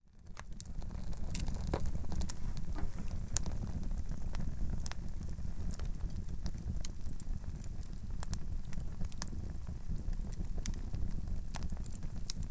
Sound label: stove, Sergiyev Posad